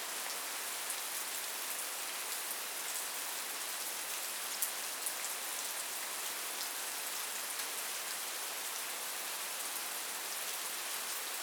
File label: Rain; Fishing2